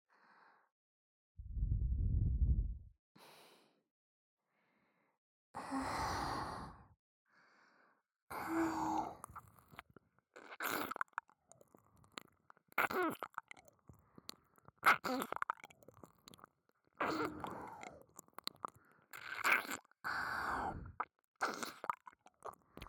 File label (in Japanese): 左耳舐め; アドリブ集; 夫に浮気された隣に住む爆乳美人妻の仕返しえっち～強制NTR中出しでおちんぽ上書き～